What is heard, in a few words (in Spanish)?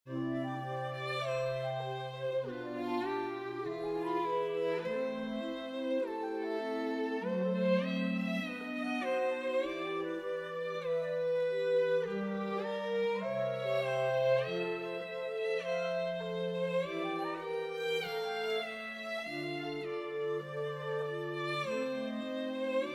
Pequeña Melodía, Little Melody